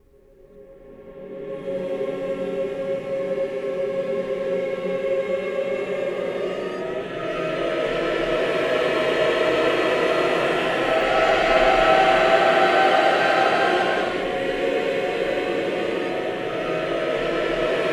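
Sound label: AVANTE reverse, Voices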